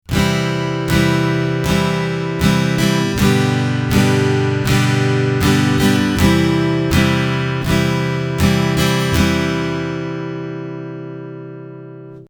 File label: easy strum, acoustic guitar